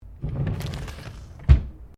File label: Drawer Interior Wooden Utility Small Full Close; Various domestic drawers; Household